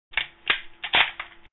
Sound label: No 1 Mk III Enfield